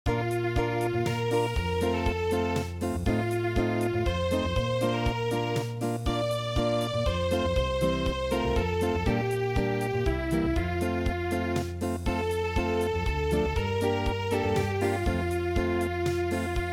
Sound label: Acoustic, traditional; Schweizerpsalm